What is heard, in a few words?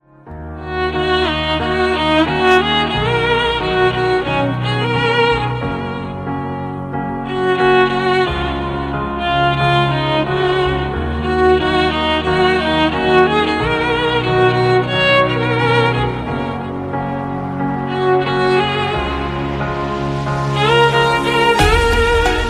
fade away violin; english